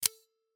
Switchblades, OTF, quality, close, unsheath, mechanical, tight, spring, ringing; Eiravaein Works